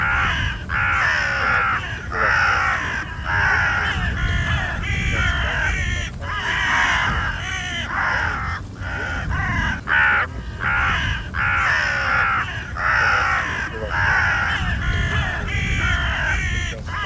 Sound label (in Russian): Черная Серая ворона Cornacchia